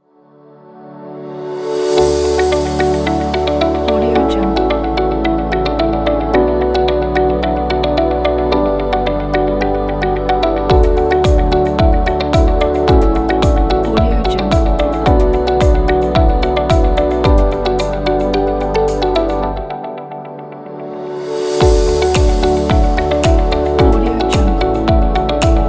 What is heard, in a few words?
upbeat corporate